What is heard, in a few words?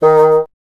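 wind bassoon D#; Miroslav Vitous - Solo Instruments VOL-1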